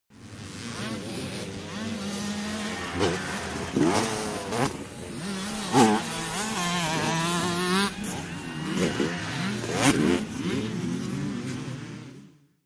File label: Motorcross Jumps By, motocross